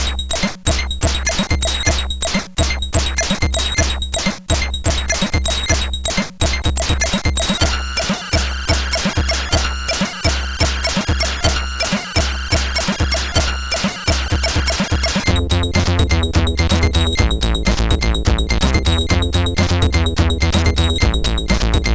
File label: House Beat, CSG8580R5; hvsc; MC